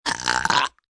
Burp High Growl, Burp, high-pitched, throaty, long and wet (human voice); Burping